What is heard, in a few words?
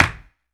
CLAP, Human Perc, Body Perc